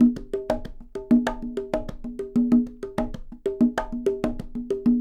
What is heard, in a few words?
Percussionworks